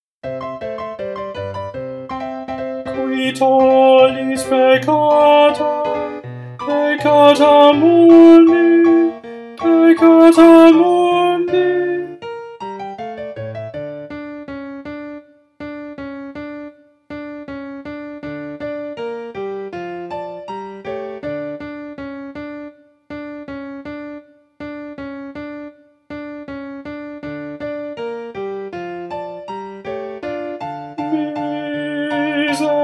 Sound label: Tenor